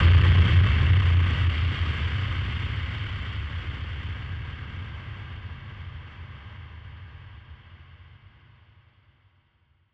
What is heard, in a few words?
Drum Bomb; musicradar; bomb factory; Drum Bombs